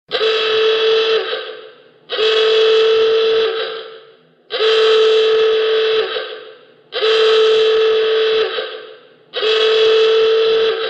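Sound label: Old Klaxon Alarm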